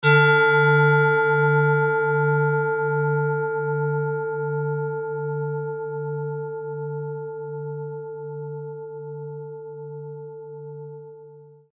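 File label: tibetan bowl marsset, D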